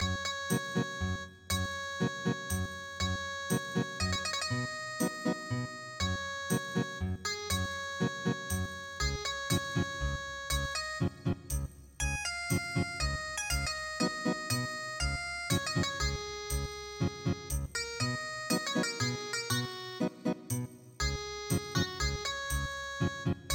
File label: Ethnic Guitar; gwang han ru